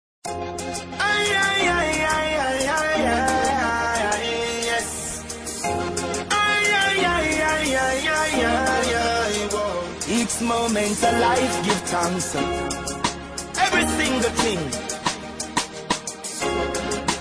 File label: bass riddim, ringtones